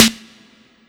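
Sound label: Tinny Snare; Zero-G; Soundclash Nu Dance Electro; Construction Kits; Feet First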